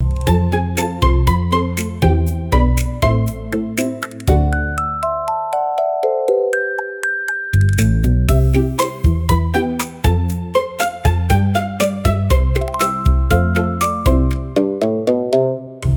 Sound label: loop